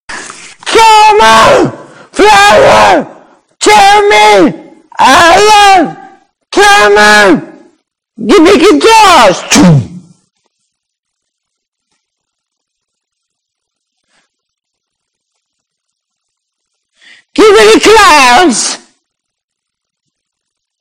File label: gimme guitars